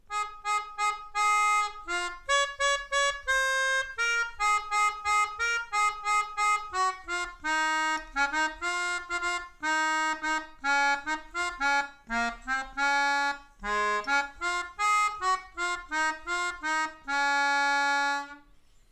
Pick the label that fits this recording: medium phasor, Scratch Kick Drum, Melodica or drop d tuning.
Melodica